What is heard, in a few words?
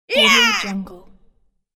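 girl combat shout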